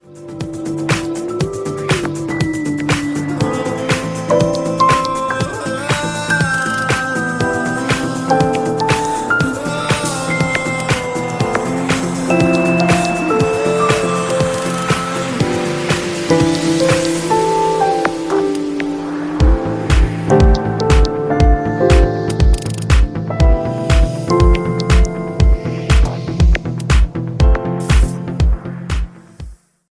Lenovo Note Ringtone

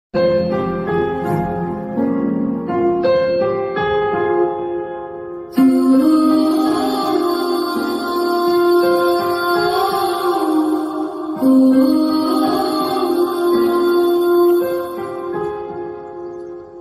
Tamil Love BGM Ringtone; RRR

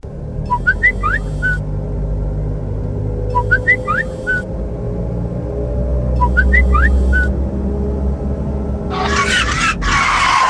Ringtone; SAMSUNG; Stock Thompson

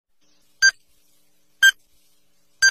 Dialer Message Ringtone

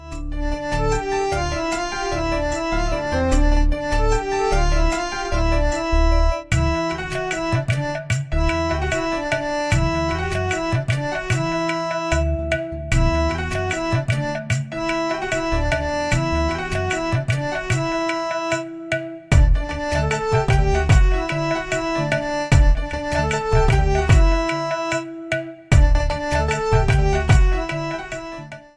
Tamil Sweet Melody Ringtone

